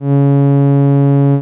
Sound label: glottal doubled